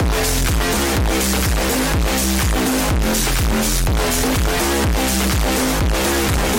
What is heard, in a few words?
intro bass boosted; ali-a